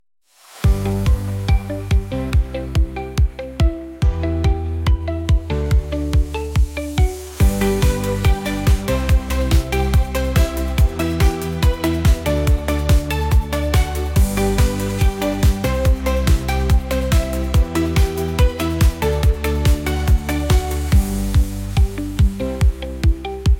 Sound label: Synthpop Siren